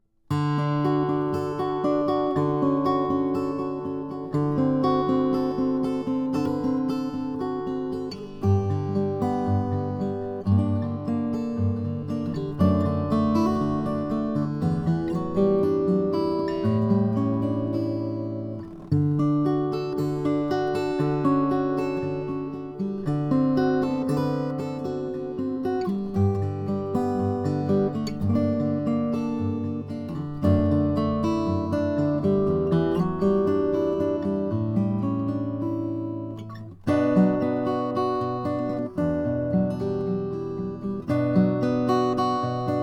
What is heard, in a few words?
branzell 000 12 brazilian rosewood